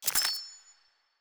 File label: Future Sounds, Futuristic Interface; ArmyRaid